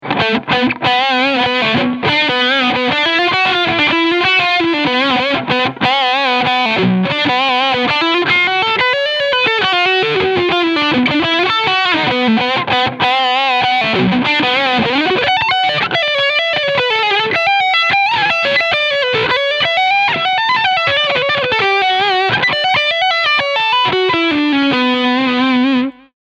Sabre Lead Sound Steg PU Humbucker Mode; Sterling by Music Man

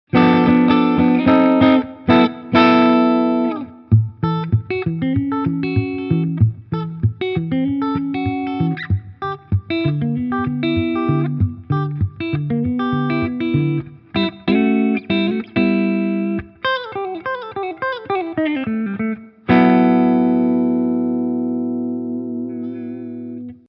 Gretsch G2622T Clean Mitte

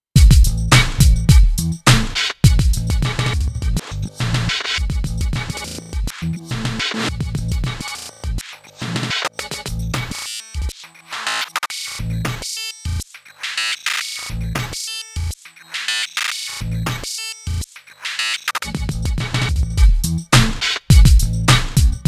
Roland MX-1 Song Master FX Combi Scatter alle Variationen

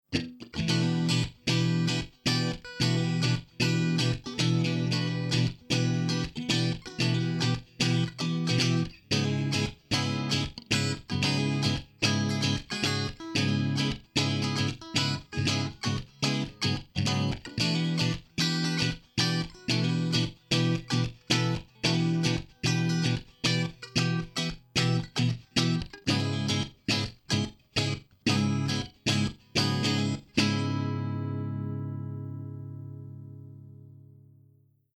Fender Strat Mix aller Signale Stereo; Lewitt LCT; mit GK ML

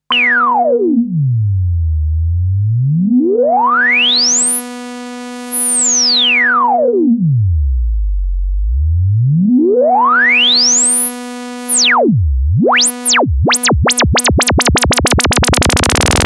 SH-1 Filtermodulation

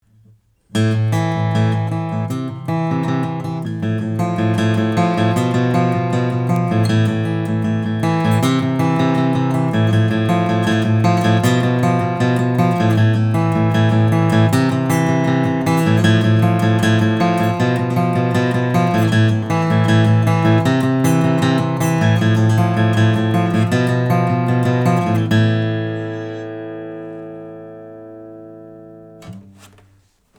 Gitarre; LCT-340

